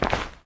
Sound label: footstep; smite; apollo v4 skin14